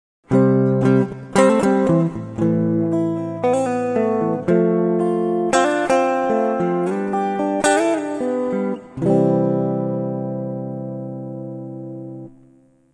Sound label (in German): Fill in